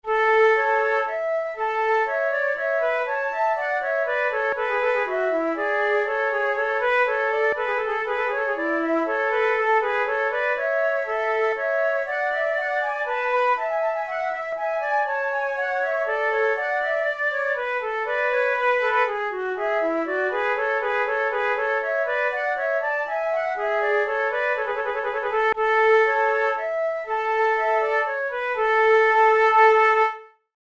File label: prelude, flute